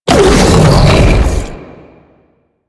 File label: Biped Windup; Warframe; Man In The Wall